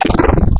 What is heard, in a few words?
Shredder, Growl Tables; Cymatics; Growl Tables Vol 3; Serum